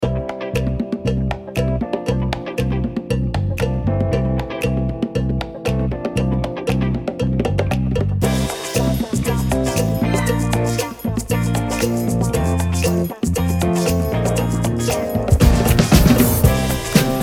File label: Music Disco; Vinted